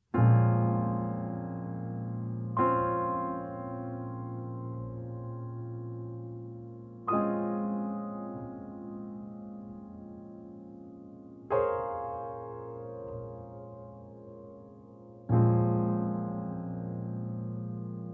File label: Bbmin7